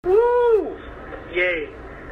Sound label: wooo yay